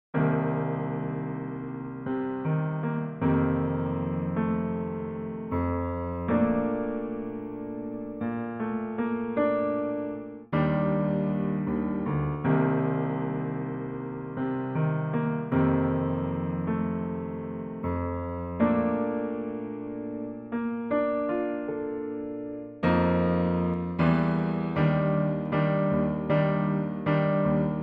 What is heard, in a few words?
auto piano